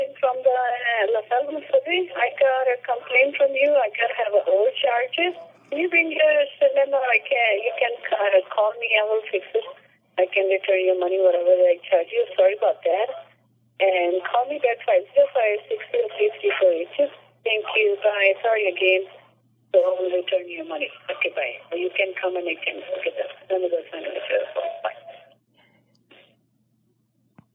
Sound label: Subway recording